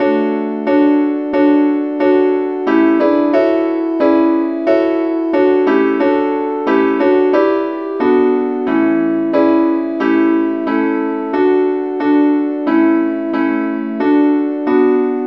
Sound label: advanced lead, key D; SAINTTHOMAS